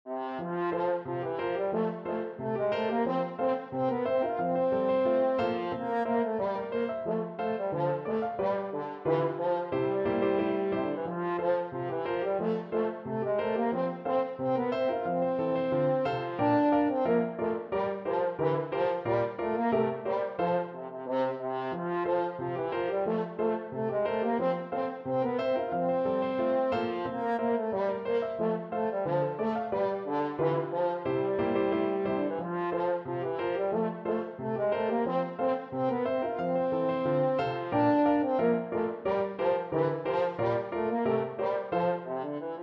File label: american patrol, french horn